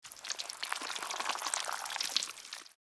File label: Slimy organic movement wet slithering; slug and worm; squelchy and organic